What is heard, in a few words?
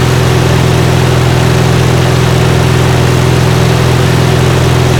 Warrior Engine Max; DCS